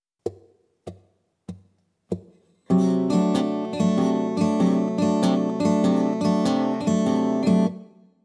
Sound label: Open Tunings Blues Rock Guitar; An Easy Guide To Open Tunings For Blues Rock Guitar